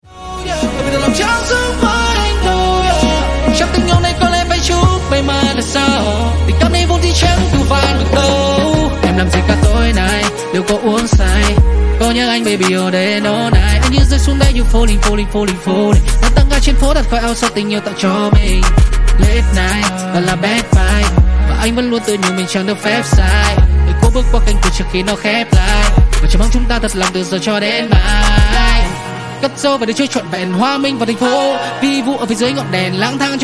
rap

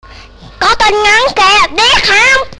Nhac chuong tin nhan

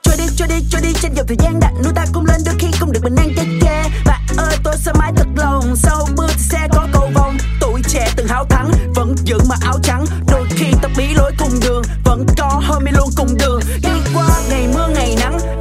Nhac Chuong Rap

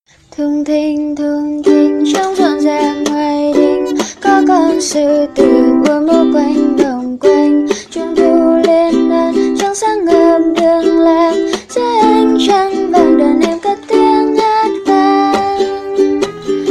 Bai hat Thung thinh thung thinh trong ron rang ngoai dinh

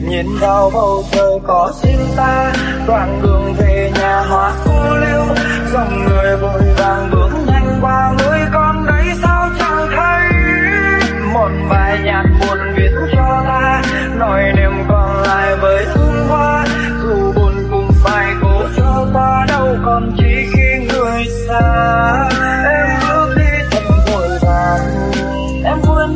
Nhac Chuong Lofi

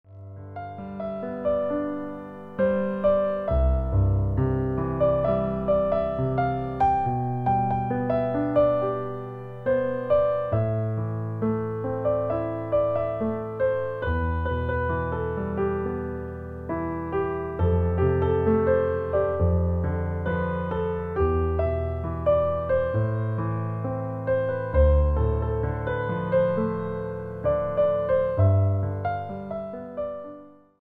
khong loi piano

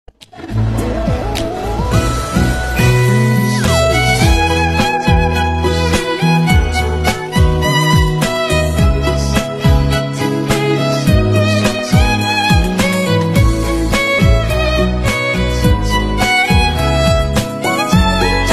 violin khong loi

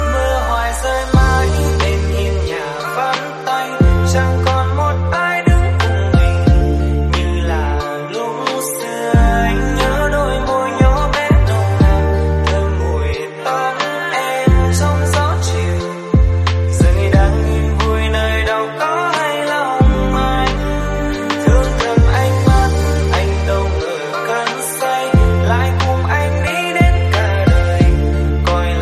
Nhac Chuong Lofi

